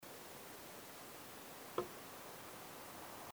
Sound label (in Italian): Colpo